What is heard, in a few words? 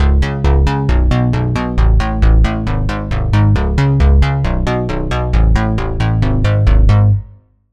Bass, Sylenth1; Routed Sound; Sylenth1 Expansion - Reality Maker Vol.1